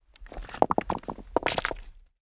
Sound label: foley large rock roll move beach rockpool